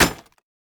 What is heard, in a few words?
vs metal; LoL; jax